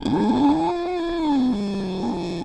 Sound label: zombie death throes